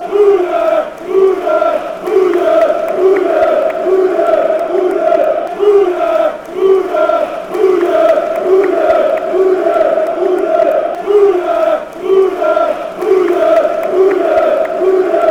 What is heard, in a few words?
chant; club brugge